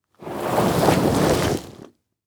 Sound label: Impact Body Drag Through Dirt And Rocks Short